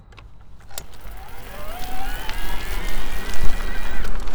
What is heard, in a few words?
ebike mountain turbo mode onboard micro; eomys; scott